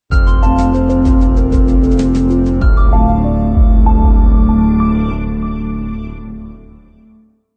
Ambient Logo No FX Stinger

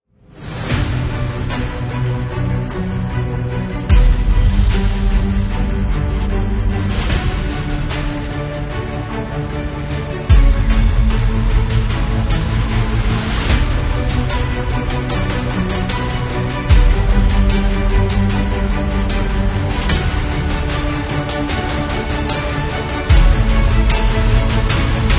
Logo Hybrid

